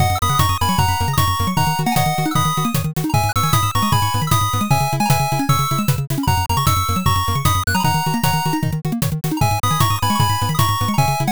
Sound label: bgm drive